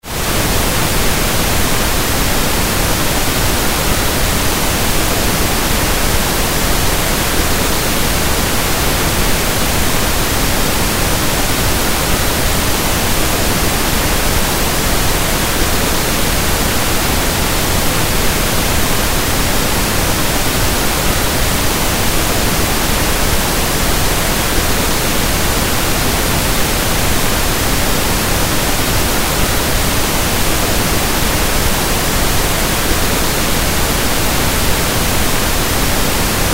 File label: Mighty Pink Noise; sleep calm chill noise meditation podcast